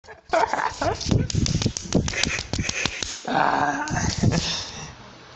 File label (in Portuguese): rindo